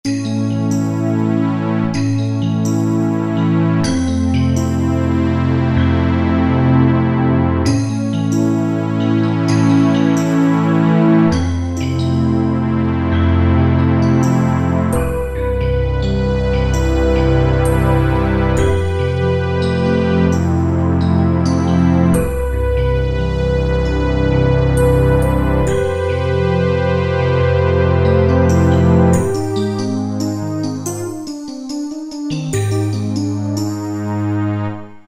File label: Yamaha TG77; Factory Presets; SP Arianne